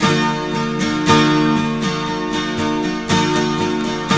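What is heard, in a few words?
12 string minor E2, guitars; Spectrasonics; Hans Zimmer Guitars VOL-1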